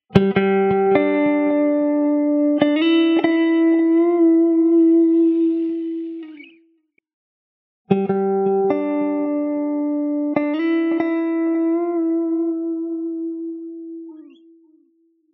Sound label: clean volume